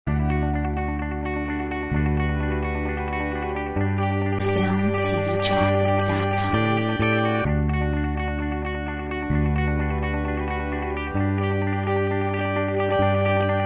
Ambient mix

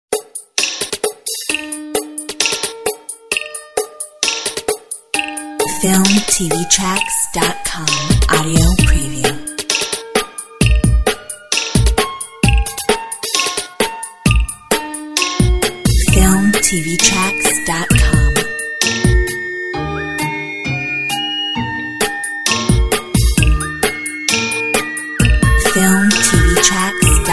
URBAN STYLE

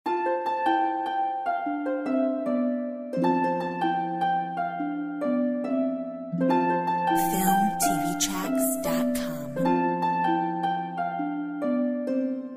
Dance of the stars Harp

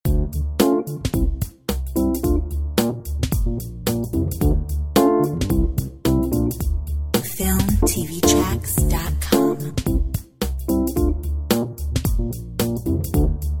Jazz

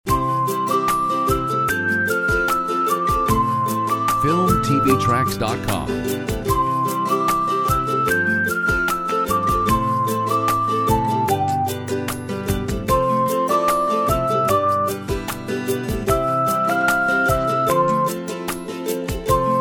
Upbeat Ukulele

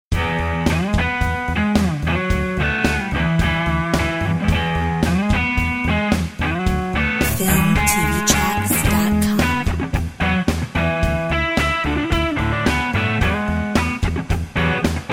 Blues

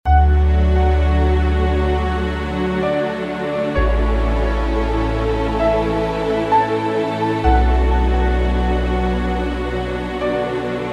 Ambiance Orchestral Romance; FILMTV-TRACKS

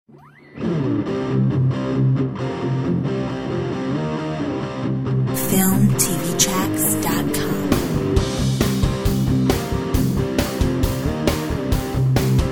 Solid rock; Filmtv-tracks

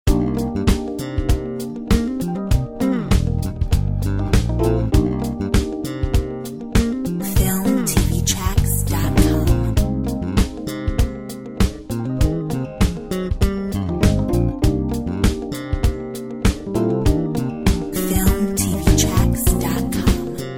Sunset jazz; FILMTV-TRACKS

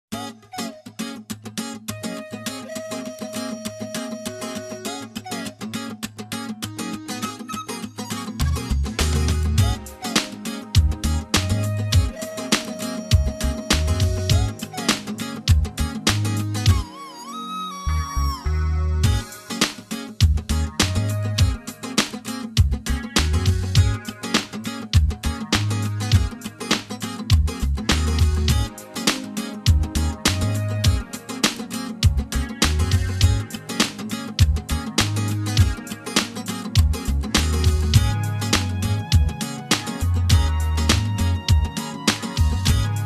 flute

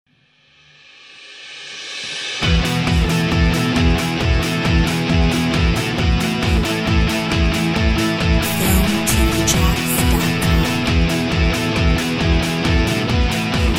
Rock Energy

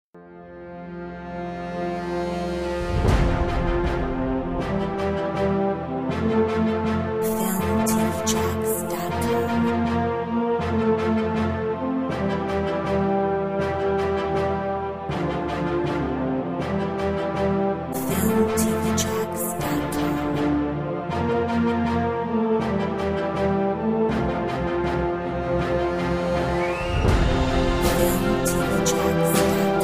Trailer cut

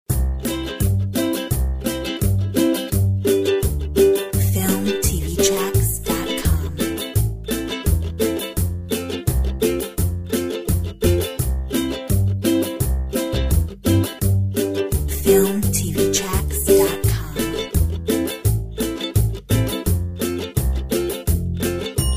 Happy Music; Filmtv-tracks

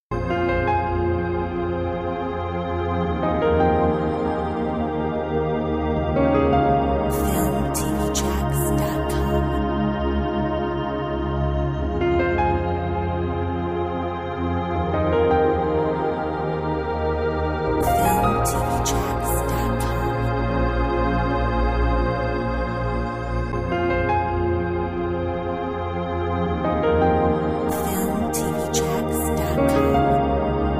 3D fantasy

